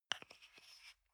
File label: toothpaste screw cup close soft single